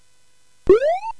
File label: Dark Castle, CSG8580R5